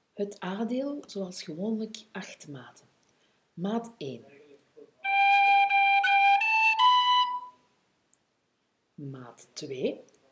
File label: kesh jig A